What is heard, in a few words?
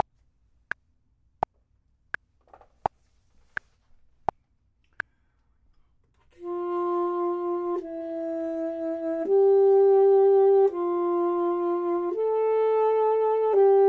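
top, Saxophone and Clarinet; voxman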